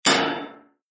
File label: block 2 sound; Caravan Beast